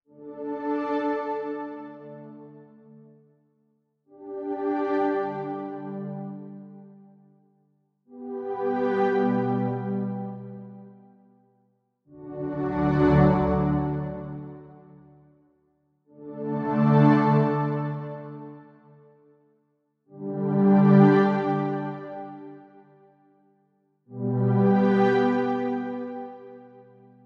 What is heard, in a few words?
apple piano violon